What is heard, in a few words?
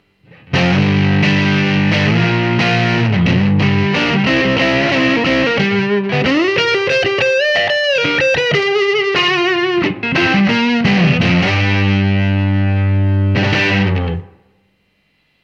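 high gain tele neck; GAINOVER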